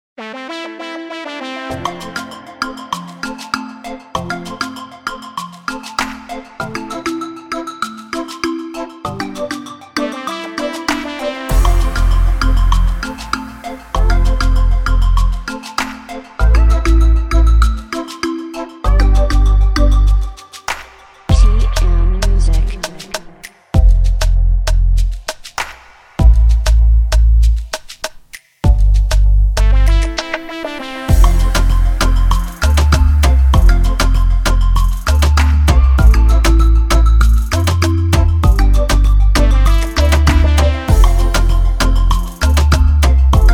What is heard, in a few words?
instrumental